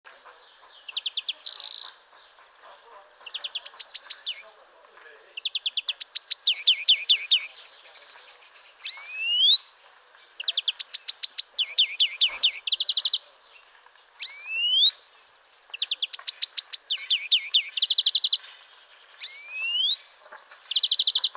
Chloris chloris; SETE

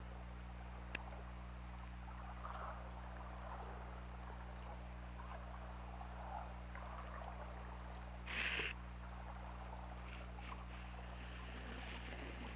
Orca; OrcaLab

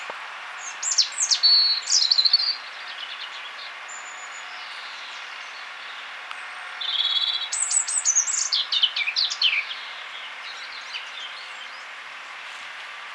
Erithacus rubecula; SETE

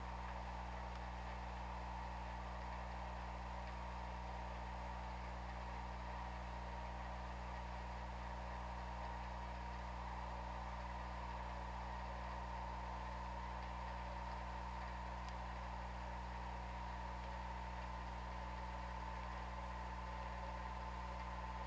Orca; OrcaLab

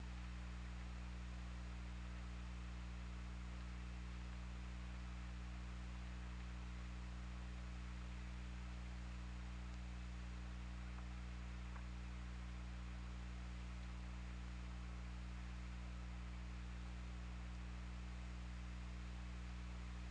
Orca; OrcaLab

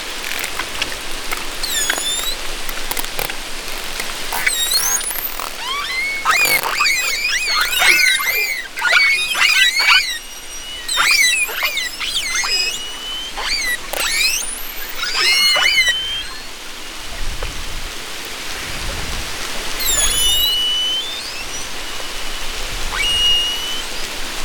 pilot whale calls whistles; NORTEK

